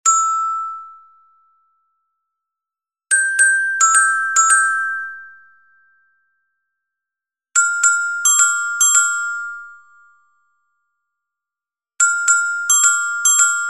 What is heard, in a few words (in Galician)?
RUMBA Carillon Soprano